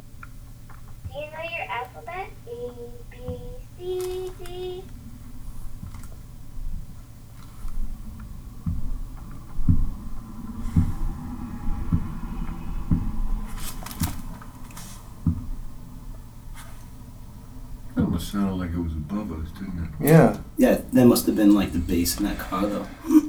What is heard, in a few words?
3rd floor footsteps; FOOTSTEPS AGAIN?